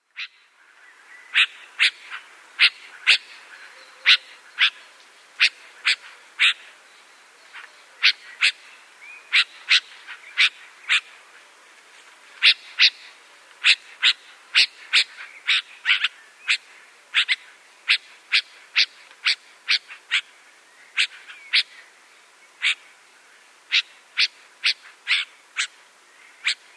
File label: Yellow-vented Bulbul calls